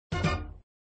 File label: Sound Fanfare End of Ball, flipper